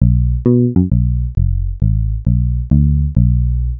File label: Guitar Bass